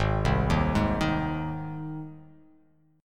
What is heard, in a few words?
Ab Major Ab down; arpeggiated; JGuitar